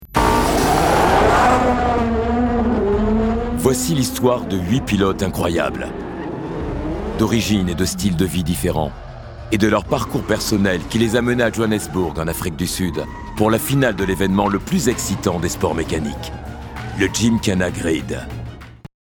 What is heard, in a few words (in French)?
Gymkhana Moteurs